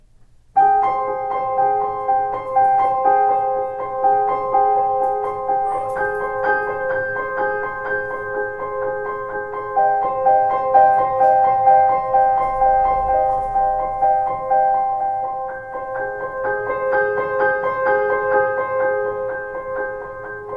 Piano

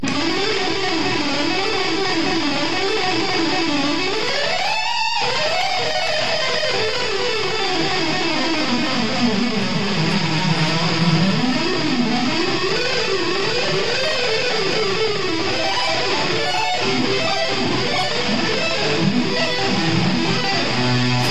guitar rock solo

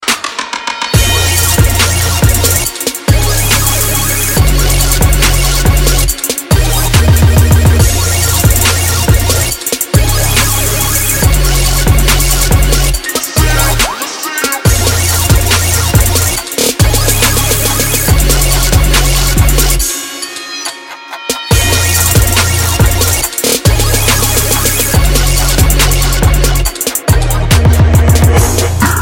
Trap

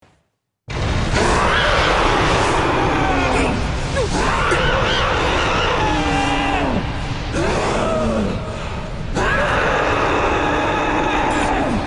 Female titan roar

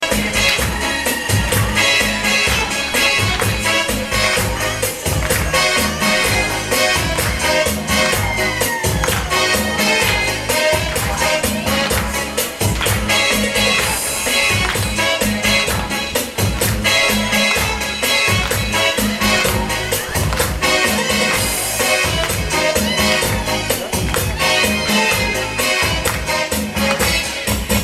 latin music; ringtones; latino

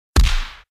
Punch; Indiana Jones

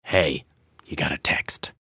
text tone

